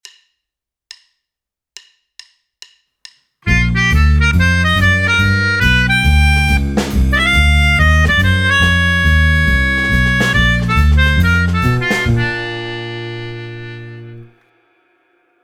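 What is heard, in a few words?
exercise 3 soloist; uprazhnenie 3 2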